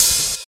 tamed open high hat sample C key, open high-hat tuned to the C note